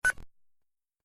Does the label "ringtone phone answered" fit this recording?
Yes